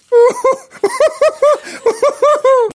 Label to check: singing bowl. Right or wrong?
Wrong